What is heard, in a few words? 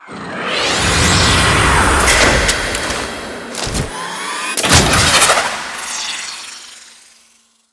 Archwing Trench Run Intro Detach; Cin Liset